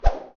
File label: Arrow Release